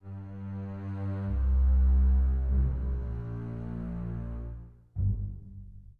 tired; Horncomposer lesson 1b

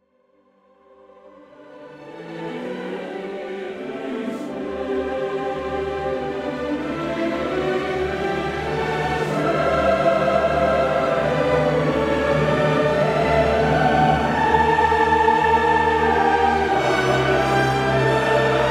Choirs & Orchestra

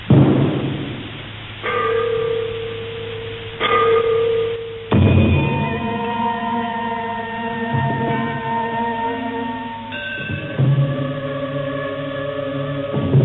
Confucian ritual yayue

